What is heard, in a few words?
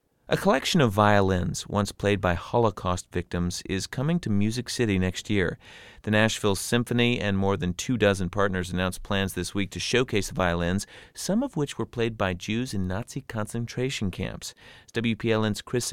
violins